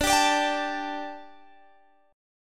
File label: A 7th Suspended 4th D